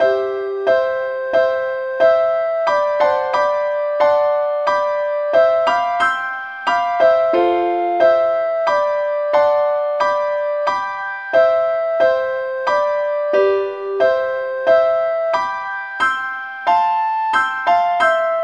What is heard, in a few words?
lead sheet, key C; SAINTTHOMAS